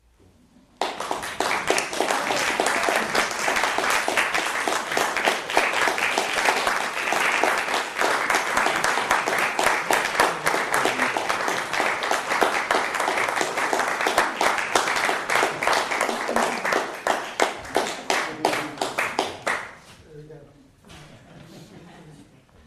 Polite Applause With A Few Sniggers

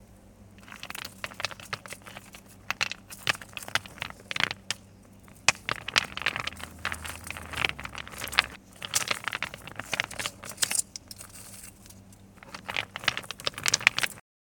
Stones

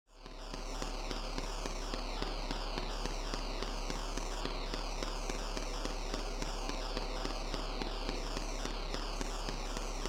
Space Sound

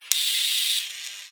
Welding

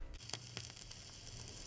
Record Needle Hiss

